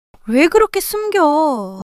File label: surprise